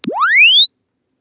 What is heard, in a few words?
Press Two Sides Chirp; Samsung 4 Recordings